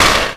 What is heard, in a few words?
Noise Hit; Drums & Drones